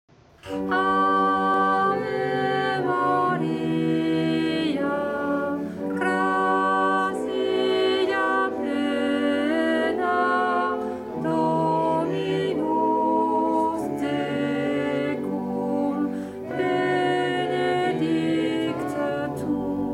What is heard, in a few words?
Ave Maria Alti